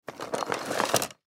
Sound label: Briefcase Rummage Through; Office; Blastwave